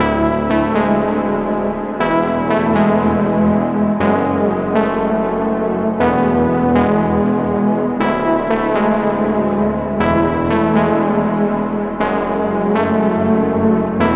Key

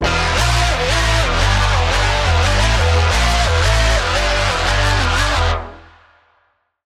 Lead, Spire; Routed Sound; Spire Expansion - Quantum Enforcer Vol.1

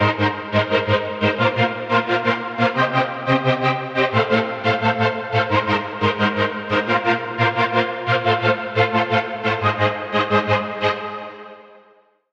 Lead; Never Lost; Routed Sound; Vital Expansion - Flame Summoner Vol.1

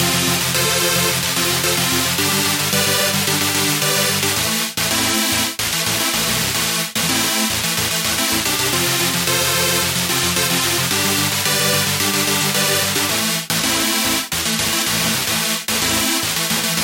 Chord - Digital Choir; Routed Sound; Serum 2 Expansion - Hyper Gamer Vol.1

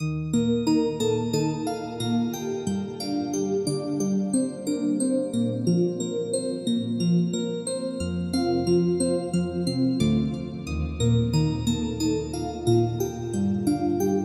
Key

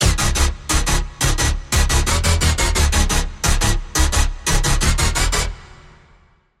Lead, Spire; Routed Sound; Spire Expansion - Quantum Enforcer Vol.1

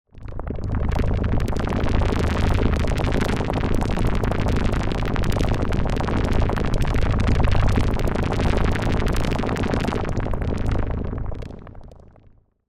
SFX, Sylenth1; SFX - Liquid Electricity; Routed Sound; Sylenth1 Expansion - Quantum Enforcer Vol.1

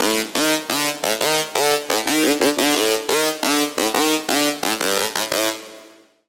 Lead, Serum 2; Source; Routed Sound; Serum 2 Expansion - Chaos Bringer Vol.1

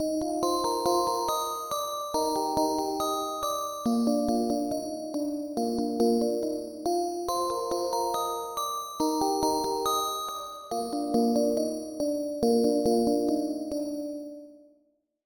Pluck; Routed Sound; Sylenth1 Expansion - Flame Summoner Vol.1

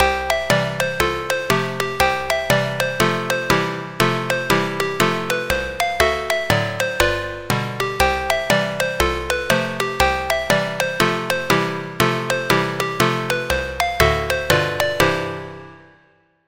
Key, Sylenth1; Key - Talking Animals; Routed Sound; Sylenth1 Expansion - Story Walker Vol.1

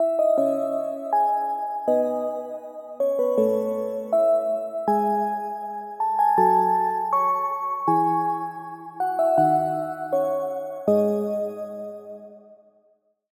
Key

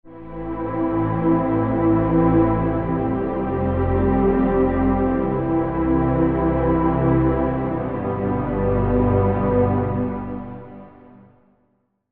Pad - Reverse; Reverse Entropy; Routed Sound; Vital Expansion - Star Scavenger Vol.1

